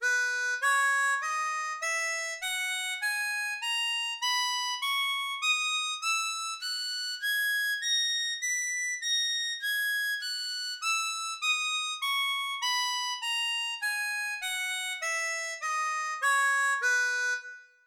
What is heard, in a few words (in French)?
e Si Majeur, Gammes, harmonica